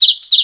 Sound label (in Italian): Canarino